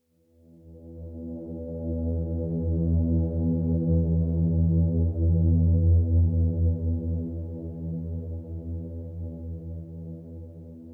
Swell Tone; HDD Theme Scull Swell Tone; inside

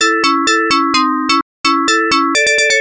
Unknown Jingle; Sansan; genesis

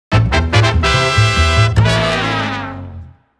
Fail; Rhythm Thief & the Emperor's Treasure

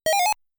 Service Switch Sound

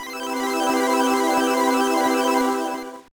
Jingle; gba; Summon Night Craft Sword Monogatari Hajimari no Ishi

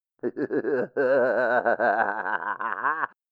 laugh mannequins hands; Don't Be Afraid The First Toy

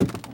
Foot Squeaky Wood Bridge Walk; Lucius III; pc linux

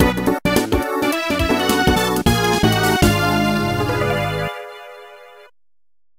Defeat Jingle; Dragon Ball Z Shin Butouden; Saturn

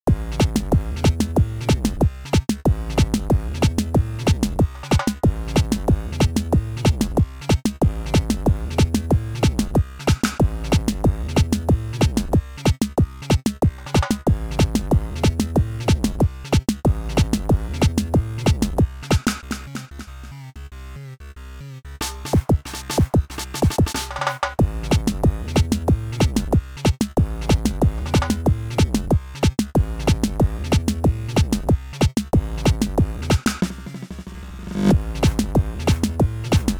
BGM rhythm; game builder garage 2021 switch; Node 08

